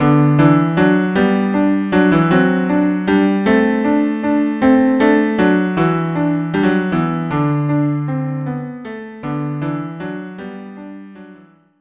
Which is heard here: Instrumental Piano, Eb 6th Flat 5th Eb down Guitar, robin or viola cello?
viola cello